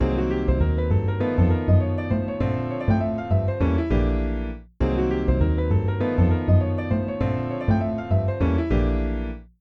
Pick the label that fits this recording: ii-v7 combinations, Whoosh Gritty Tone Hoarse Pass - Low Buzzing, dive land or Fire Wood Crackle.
ii-v7 combinations